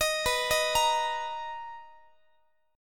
B Major 7th B, Guitar; BM7; arpeggiated; x,x,18,21,19,21; 4A,4E,5A,5D,5G#,6C#

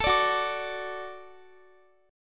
Gb Minor Sharp 5th Gb Guitar; Gbm#5; strummed; x,x,19,19,18,21

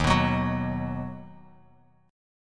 Eb Minor Sharp 5th Bass Standard; Ebm#5 strummed; JGuitar

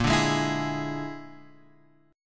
A sharp 7th Flat 5th A sharp, Guitar Open G

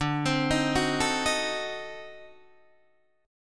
D 7th Flat 9th D down Guitar Open D

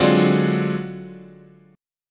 D 7th Sharp 9th Flat 5th, chord

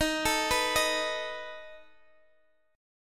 A Suspended 2nd Flat 5th D sharp, guitar

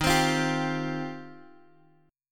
Eb 6th Add 9th, Guitar, DADGAD; 13,15,15,12,x,x

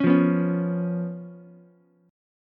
B Suspended 2nd Flat 5th B down Ukulele; strummed; JGuitar

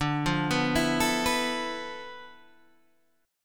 D Minor 6th Add 9th D, Guitar, Open G

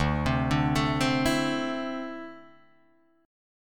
D 7th Suspended 2nd down Guitar Open D

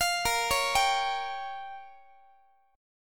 Bb Minor 6th, Guitar; Bbm6 arpeggiated

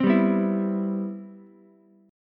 C Major 7th, C, down, Ukulele; strummed; CM7

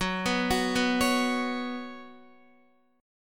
Gb Suspended 4th Gb, down, Guitar; arpeggiated; JGuitar

